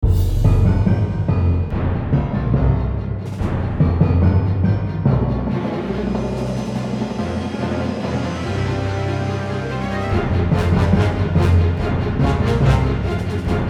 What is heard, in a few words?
Battle Music